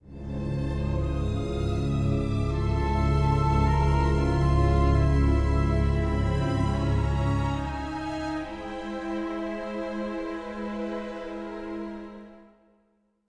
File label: suspense, music